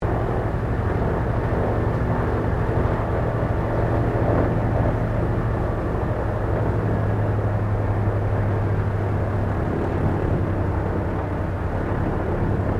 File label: AIRCRAFT PBY CATALINA SHUTDOWN INTERIOR, Catalina Flying Boat; 1942; Airplanes (Sound Effects:Vehicles)